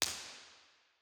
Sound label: IR La Villette